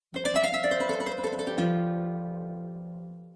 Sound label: persian guitar